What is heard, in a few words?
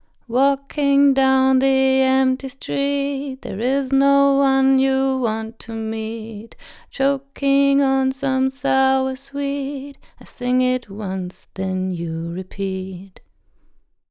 Female Vocal 10 kHz Peak 4 dB; Millennia NSEQ HF